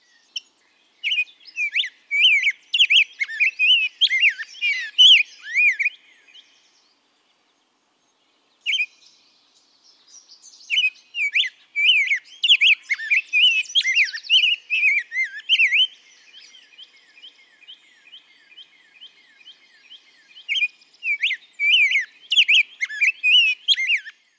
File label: Rose-Breasted Grosbeak Song; game rip; luxuria superbia